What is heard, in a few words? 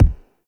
Struggle Prompt Button Press; Warframe; The New War